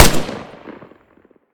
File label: smg end; immersive sounds; Factorio Paranoidal mod; cyclic